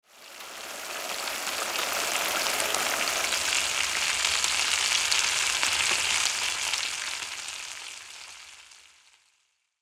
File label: Pan frying slow cooking; household